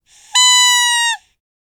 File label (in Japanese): おもちゃのラッパの音